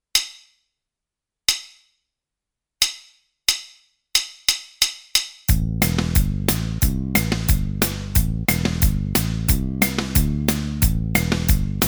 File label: Bass Riff Track